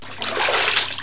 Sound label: SPLASH